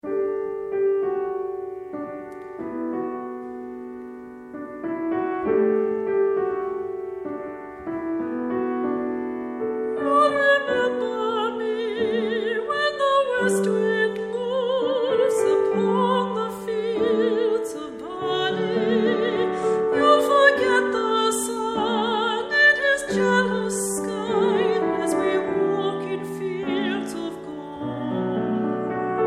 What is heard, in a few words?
Voices